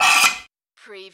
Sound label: Button Sound - Metal Tin Drag